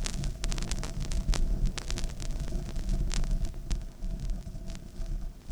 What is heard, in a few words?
Vinyl, noise